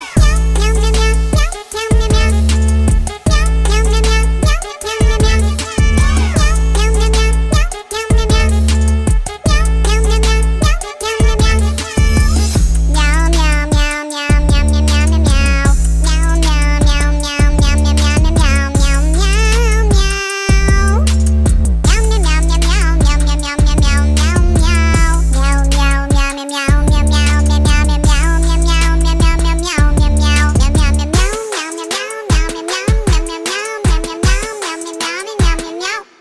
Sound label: Bongo Cat